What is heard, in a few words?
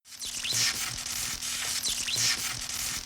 sparking